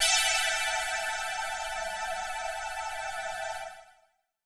125 pad E, Keys, Nu Jazz House; PowerFX - Nu Jazz House

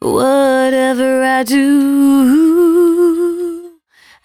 113bpm C#, Velvet Vocal; Zero-G

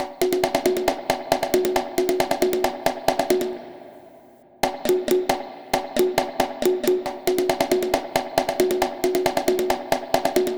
Vegas Bongos Wet, 136; Zero-G Kocktail Kollection; Construction Kitz (Lounge); Vegas Time 136

